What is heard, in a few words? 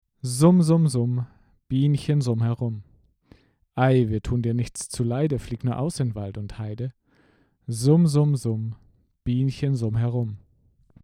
Vocals; Shure Sm58